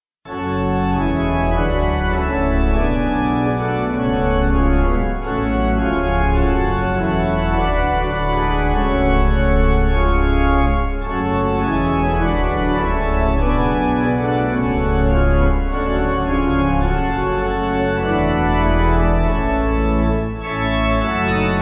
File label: Pipe Organ, Music Backing; Cofian Gwlad; Gweddi Wladgarol